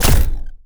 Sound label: lasersaber